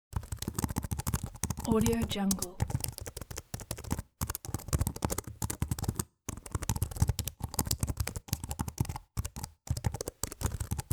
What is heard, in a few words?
laptop keyboard typing